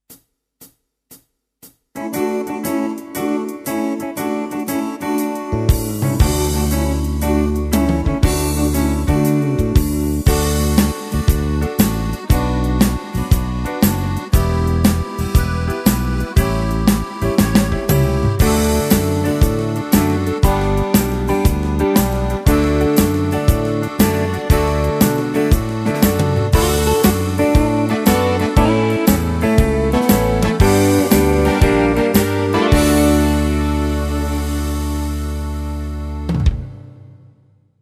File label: Mid Shuffle, tyros2; Danse Band 2